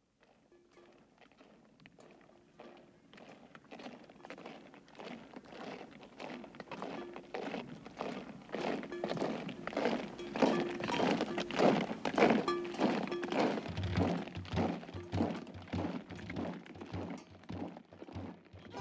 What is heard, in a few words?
marching